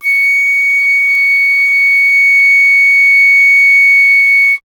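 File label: flute piccolo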